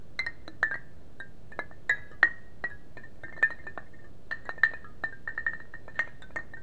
wood chimes

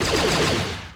gun

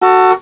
horn

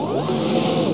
open

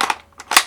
mag in

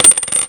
coin

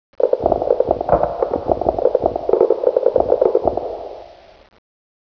dead tree

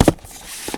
book

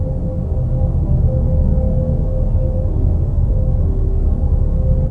E Corr Amb; trek

